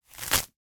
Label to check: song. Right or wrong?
Wrong